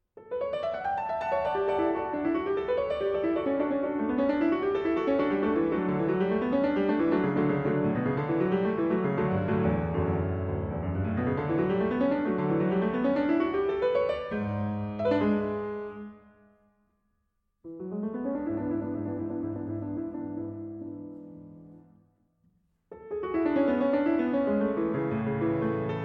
Toccata in D Major; Toccata D-Dur; BWV 912

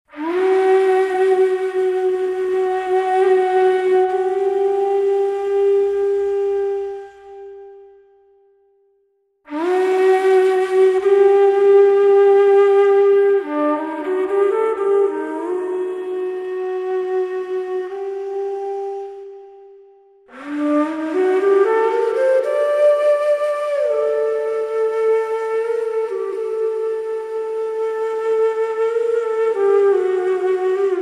Solo Flute

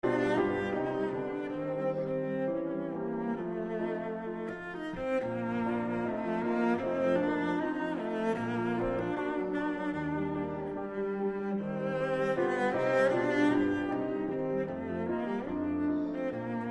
Cello Sonata in B-Flat Major, Allegro Assai

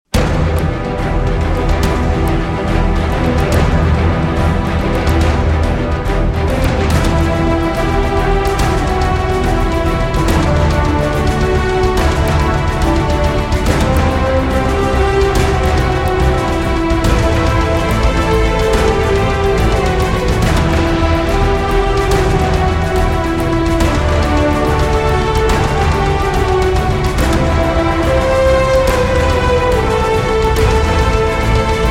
Instrumental

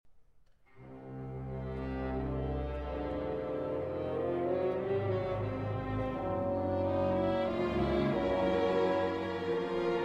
saxophone concerto

